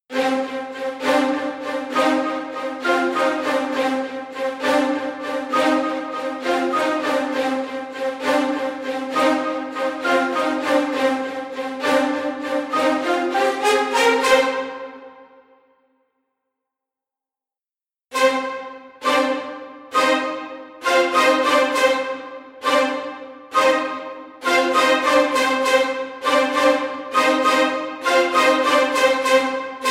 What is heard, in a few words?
high brass staccato tutti